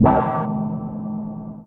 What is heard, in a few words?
Odd Stab